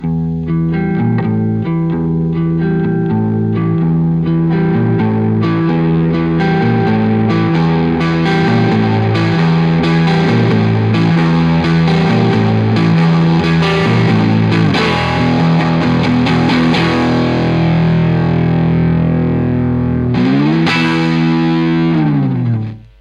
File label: strat volume